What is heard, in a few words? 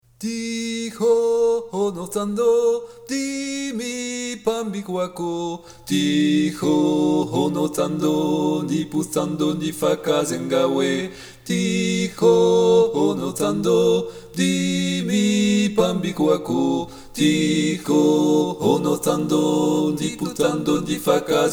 Tutti; Thixo Base